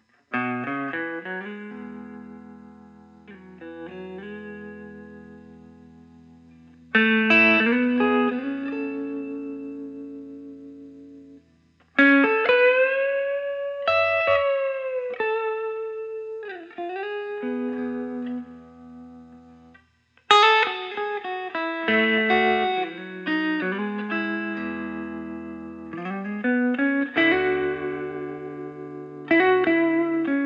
nik huber orca 59 deluxe; tobacco

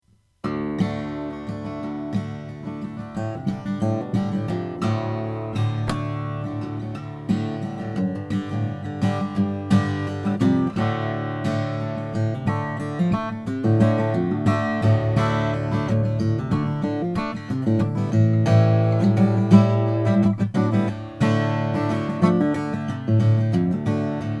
lowden ziricote; richard thompson sig

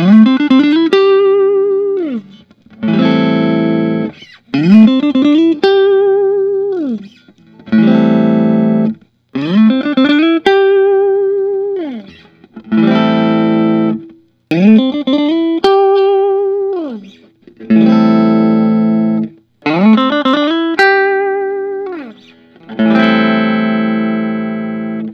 lentz traditional s

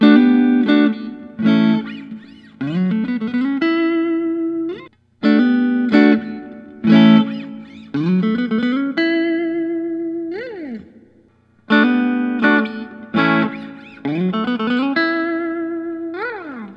fender custom shop master built

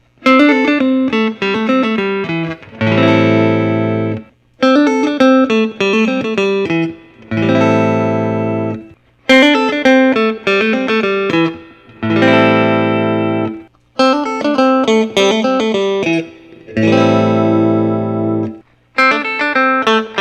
Fender Custom Shop; jason smith

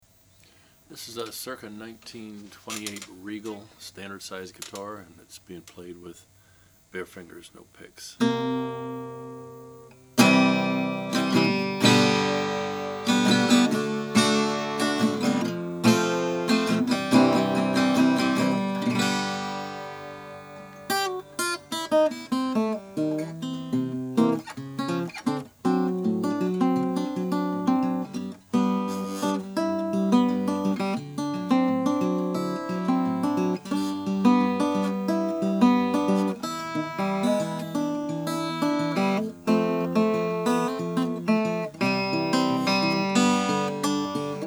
regal style natural

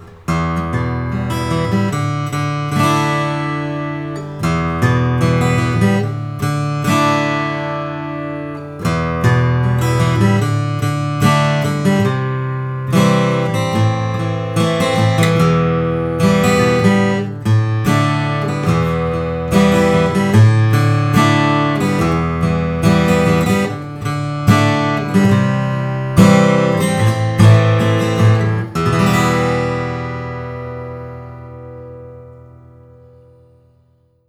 Gerber SJ German spruce natur

